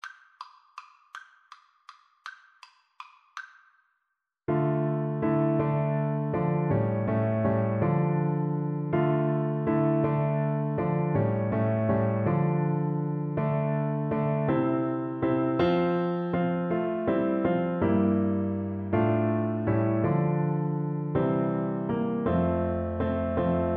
we three kings Euphonium

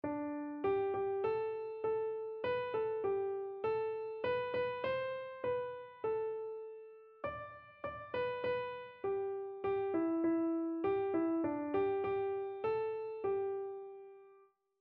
thousand tongues keyboard

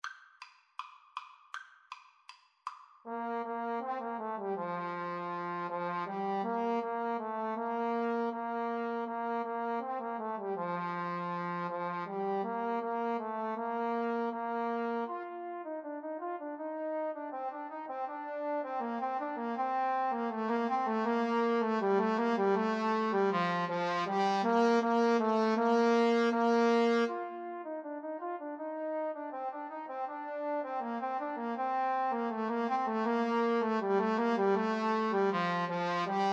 ding dong, trombone duet

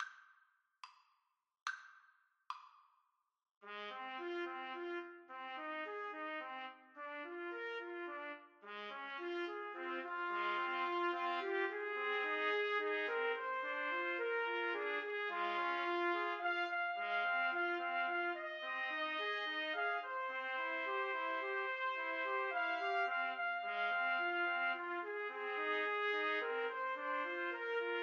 house of the rising sun trumpet trio

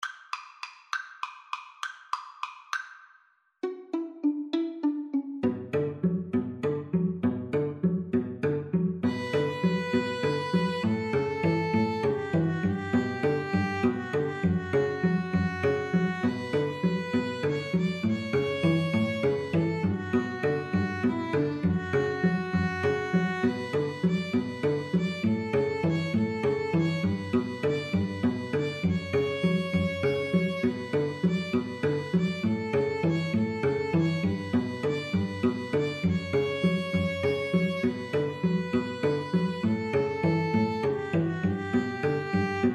spanish violins trio

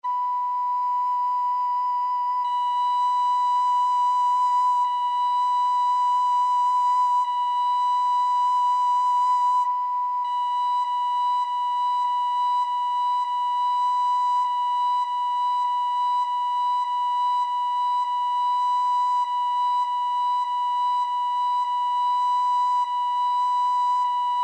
recorder

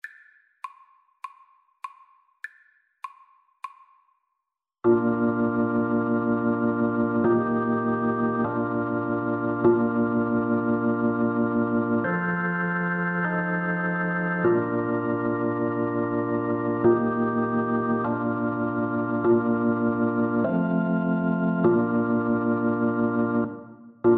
swing low, Tenor Saxophone

